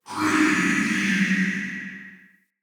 Ghost Soldier Vocal Idle

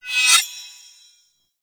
Bayonet Melee Dash Accent; Warframe; Tenno

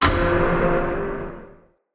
Vent Open; Encounters; Ode Pollution Tower

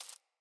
Rat Step, Foley

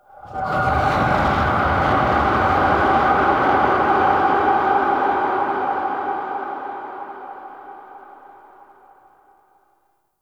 Delay Scream; Enemies; Sentient Vessel; Barks; Warframe; Tau

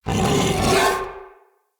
Battle Dog Attack Bite Double, Animals; Vocals; Snc Battle Dog

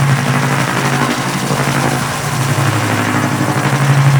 Engine Loop; Tutorial; Liset

